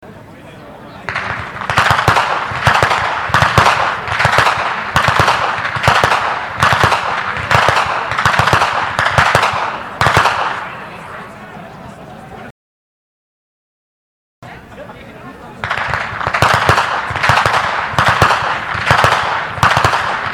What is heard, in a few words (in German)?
Schnalzen; Thundorf-Strass; Ainringer Schnalzen